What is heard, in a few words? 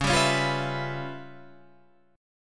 C sharp 7th Sharp 9th Flat 5th, C sharp, Guitar, Half Step Down; C#7#9b5